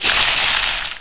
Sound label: sun hit; Hexen II; crusader